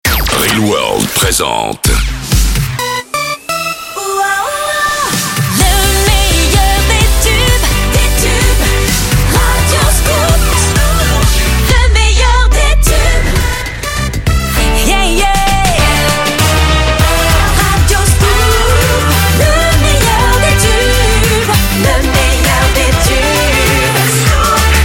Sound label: Jingles; Radio Scoop; ReelWorld